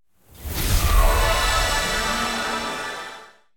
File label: random Symbol Transform; fairyhansel